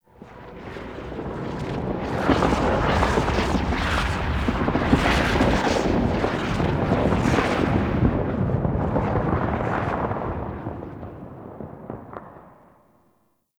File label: Wind Crackle Rumble; Tau